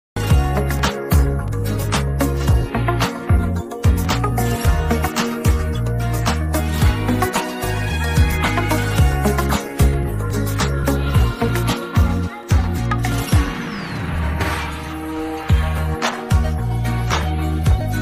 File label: music ringtone bgm